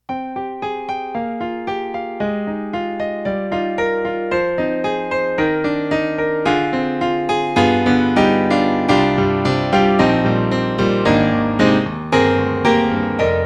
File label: KORG NX5R X Piano